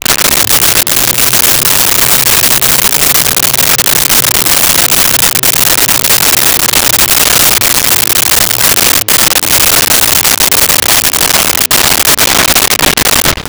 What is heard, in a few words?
LoFi Motor Whir Down; Vintage Cartoon; Foley